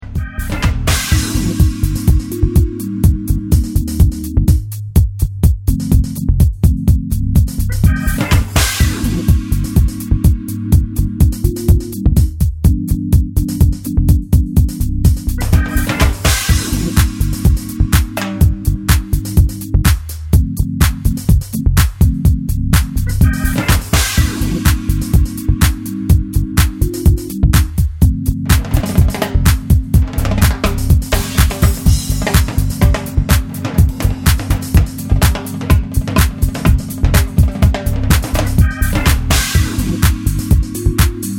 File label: organ nights, dub